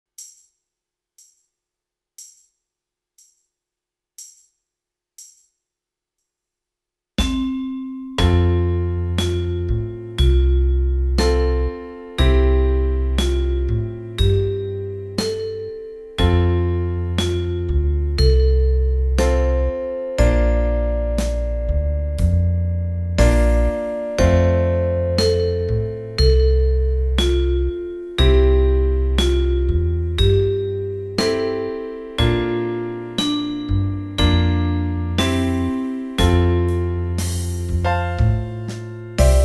auld long syne 6er Ocarina; auld lang syne

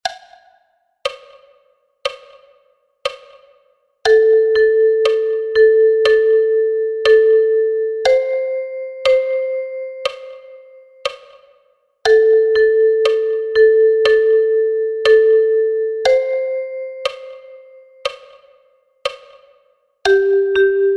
tom dooley, Tremolo / Oktav Mundharmonika; folk gospel songs; Duo

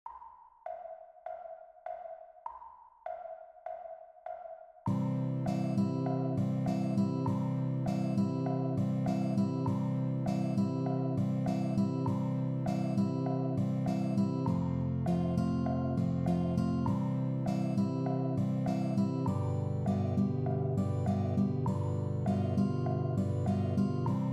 Blow ye Winds Begleitung, bluesharp; Seemannslieder

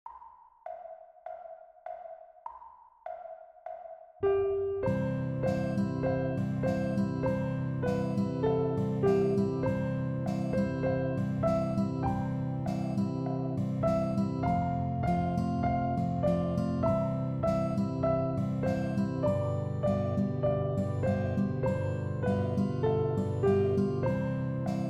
Blow ye Winds, bluesharp, sea shanties; Seemannslieder

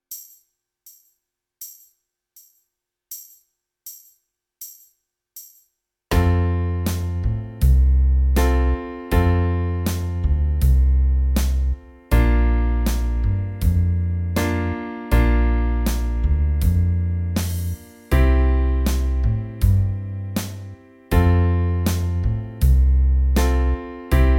auf der schwaebschen eisenbahne band, Tin Whistle; Volkslieder

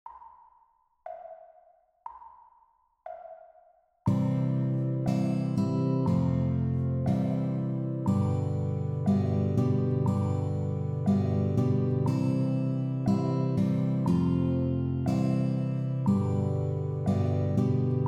Donkey Riding, ocarina; Seemannslieder

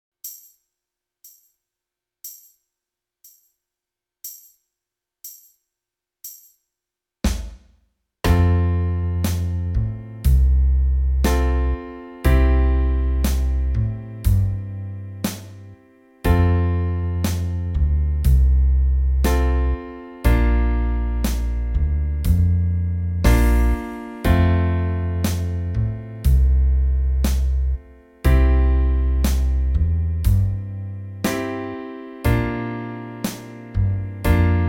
auld long syne band 6er Ocarina; auld lang syne

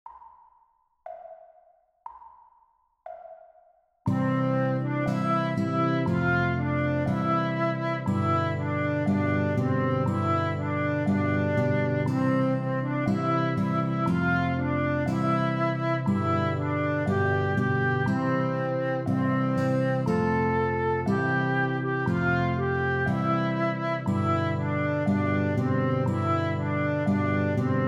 Donkey Riding, ocarina; Seemannslieder

